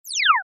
Minimise, Effect To Accompany Minimizing Of Window Or Program; Transitions; Multimedia